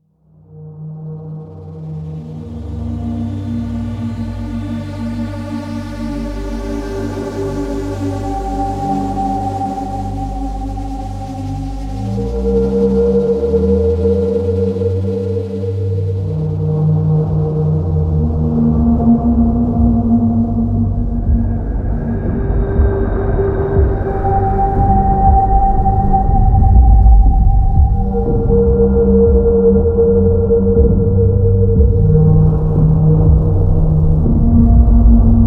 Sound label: 60 bpm, soundtracks